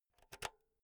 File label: insert jack cable